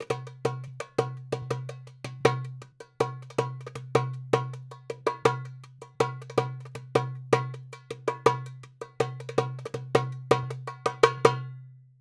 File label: darabuka loop, 80; perc construction loops; SEISMIC Drum Sample Pack; Slate